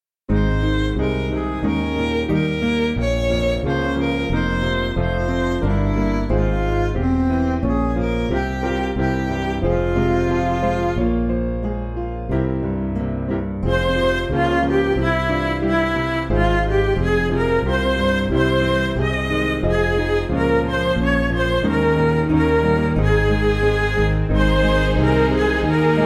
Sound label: Fairest Lord Jesus, Midi; Munster